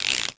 Ratchet

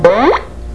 Beep

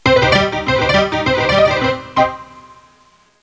Piano

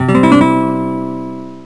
Guitar

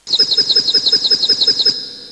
Peep Peep

